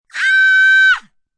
Scream Short, Scream, female, short, high-pitched, fear, terror (human voice)